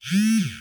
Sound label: cellphone vibrate short